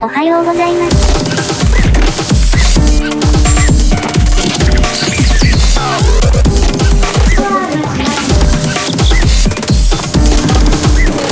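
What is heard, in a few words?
background music crossfade; Invaxion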